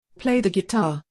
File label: play guitar